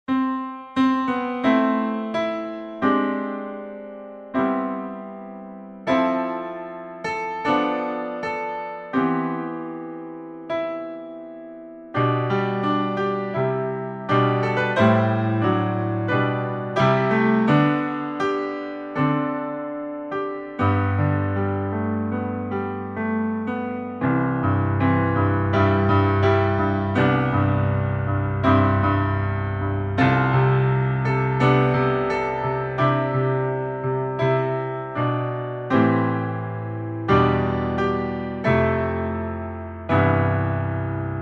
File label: Piano Solo, Original Key C